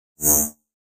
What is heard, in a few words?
Elect Buzz, multimedia element